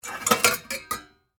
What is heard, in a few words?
Household Pots Movement Remove From Cupboard; Kitchen